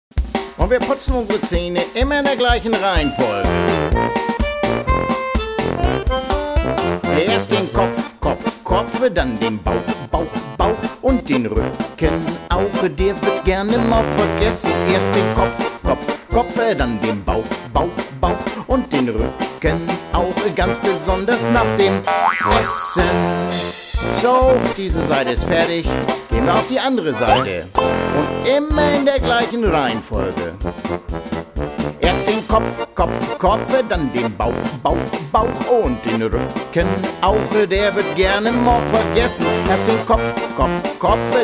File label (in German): Song